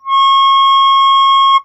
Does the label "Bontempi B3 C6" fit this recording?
Yes